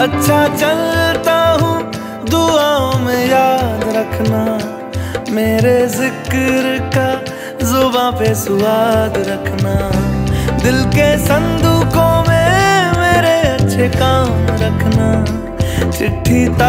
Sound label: Bollywood Song Ringtone